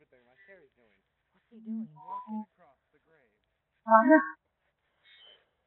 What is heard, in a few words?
aaaah